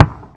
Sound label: Exhaust Fire